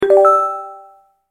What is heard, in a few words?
marimba ascend; simple ajax chat